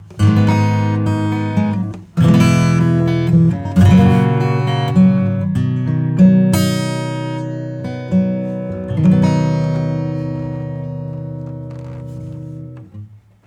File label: acoustic; Growler